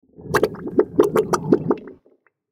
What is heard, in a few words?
Mud Suction, Mud Suction Liquid Gurgle Thick Submerge; Foley Mud Suction Liquid Gurgle Thick Submerge Bubbles; Blastwave